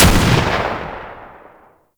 Archer Shot; Warframe; Tau; Tau Dax; Weapons; Tau Dax Archer Gun; Sybaris

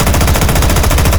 Shot Fire Loop; Rusalka; Warframe; NineteenNinetyNine; Stage8ShitboxConvoy

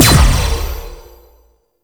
Player Weapon Projectile Parry; Warframe; Duviri; Dax

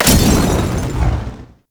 Molotov Explosion; Grineer Wolf Gang; Gangster

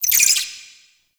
Interior Spider Chatter; Warframe; Duviri Murmur; Ambience

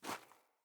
Boot Walk Gravel, Footsteps; Characters; Enemy; Boots; Sinecure; Melee Grunt

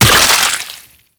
Impact Gore Large; Warframe; Weapons; Bullet Impacts

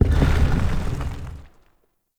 Stone Pedestal Start; UnderCity

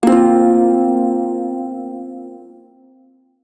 hirajoshi chord, shawzin; Warframe

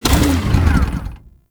Dismount, Motorcycle; Warframe; Vehicles; Motorcycle Ninety Nine

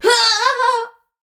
Death; Warframe; Tau; Dax; Ninja

